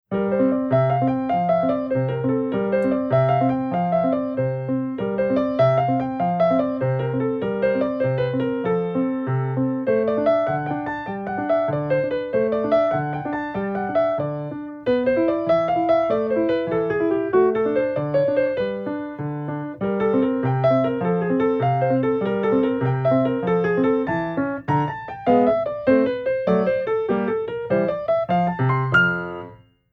Drie in de rechterhand tegen twee, piano oefeningen

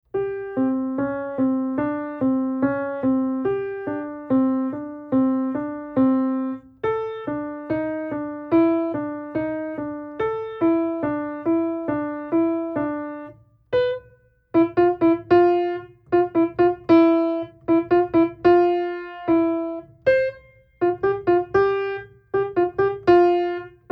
Eerste en tweede vinger (rechterh, Piano oefeningen

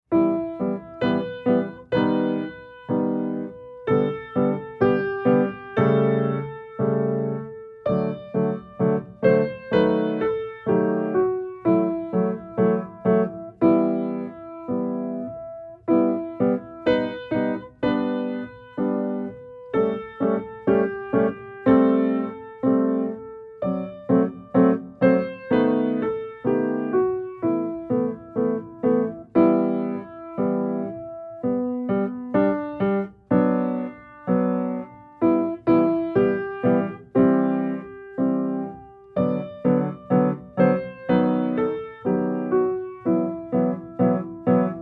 Akkoord-en melodiecombinatie, Piano

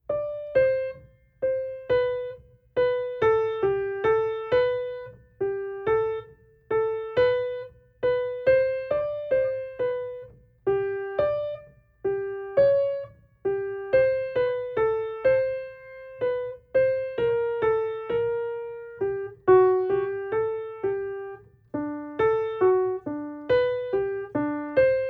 Legato-oefening voor de rechterha, Piano

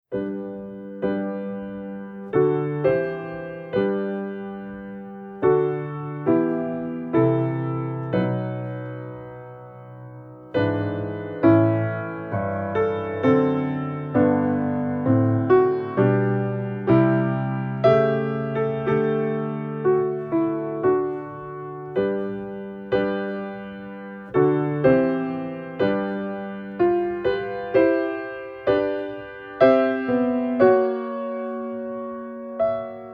Uitgebreide opeenvolging van akko, Piano oefeningen

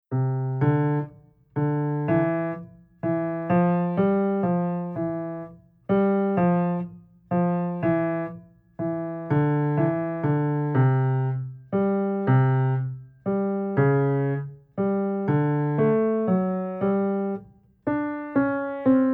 Legato oefening voor de linkerhan, Piano oefeningen

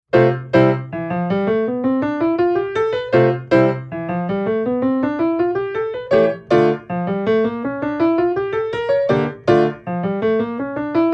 Oefening toonladder overgeven, Piano oefeningen

